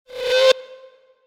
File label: message, notifications; QMobile Vita